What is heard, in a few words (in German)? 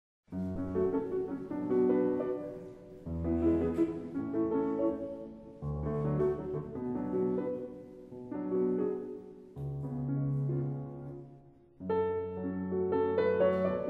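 Lied ohne Worte fis moll; Mendelssohn; Op.67 No.2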